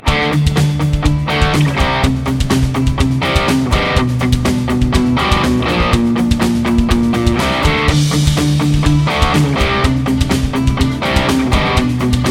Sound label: crunch pump; Clean sound; Fender Stratocaster; M1